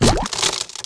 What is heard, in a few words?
tumble ring die